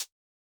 Jazz hop hihat; Hiphop

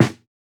snare samples, Rock

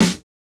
snare, snare house

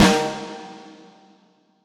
hall resonance snare

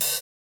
Electro open hihat; Reference Beat

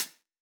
90s hiphop hihat; Reference beat bpm90

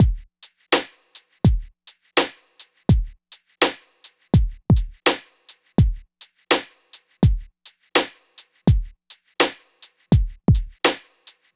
swing loop; Hiphop

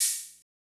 Modern open hat, Electro

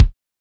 Hiphop kick; Reverb+EQ+Comp+Limit

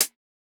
Electro hihat; Reference Beat

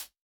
hihat; pasific; Pop

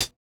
hihat, house

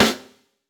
hiphop snare, Effect on

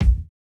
kick, house

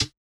Hiphop hihat

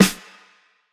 pop snare

